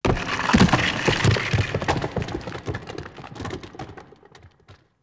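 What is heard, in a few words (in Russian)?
звук падения пещерного камня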